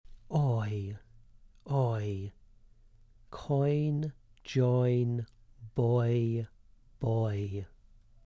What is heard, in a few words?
COIN